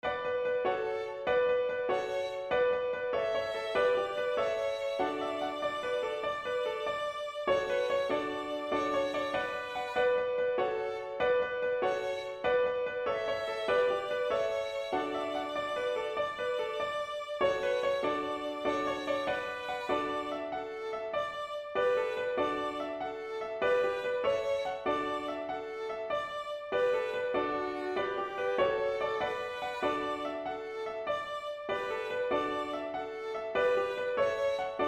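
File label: melody chords and harmony; The Ridge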